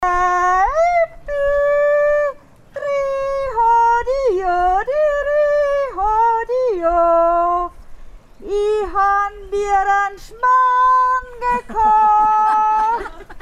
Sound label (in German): almschroa